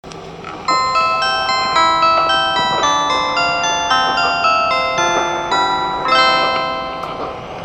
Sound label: chime; shikoku